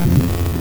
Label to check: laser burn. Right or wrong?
Right